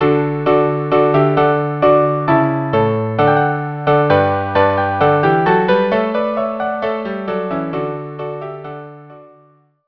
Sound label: quartet; Te Deum